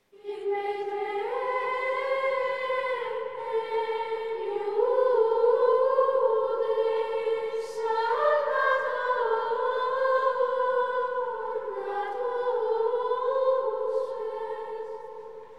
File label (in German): Ambrosianischer Choral, Gregorian chant; Missarum; Ante Evangelium; In Bethlehem Judae